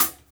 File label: HAT, Loops